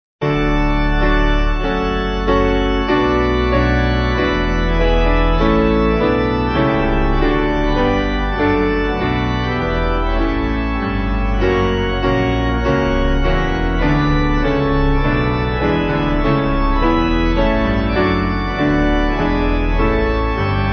Piano Organ

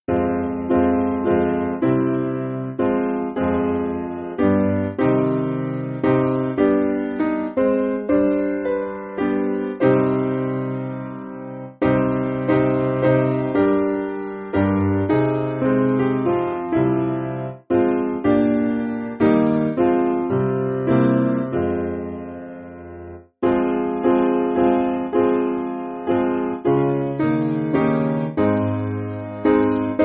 Piano

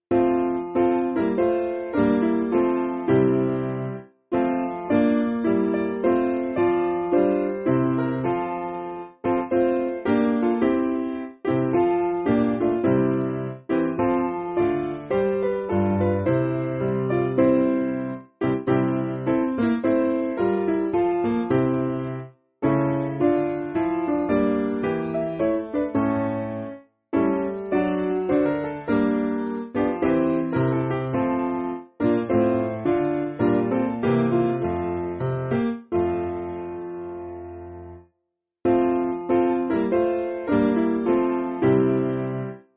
Piano; Head Of Thy Church; Dying Stephen

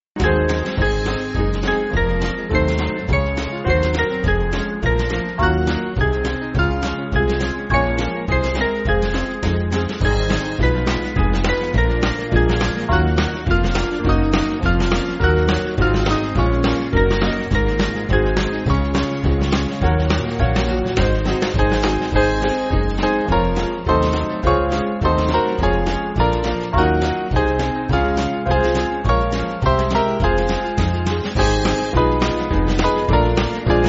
Band, church music; The Royal Banners; Parker

